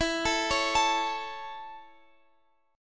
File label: C sharp Minor Sharp 5th E, chord